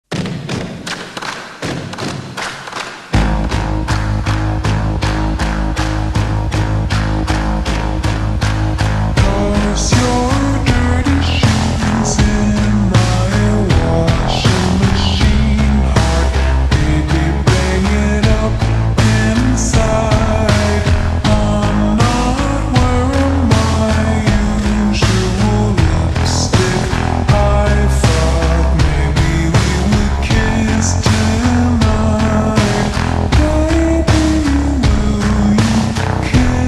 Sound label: slowed and reverbed